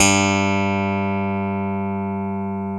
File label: FUNK BS G3, SLAP BASS